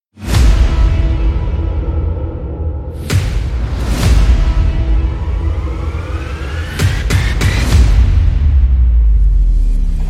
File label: Epic Music instrumental